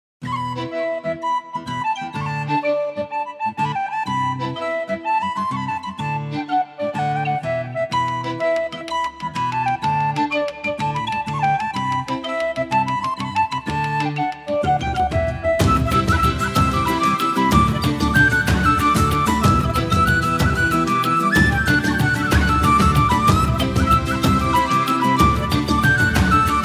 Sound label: tin whistle; at the fireplace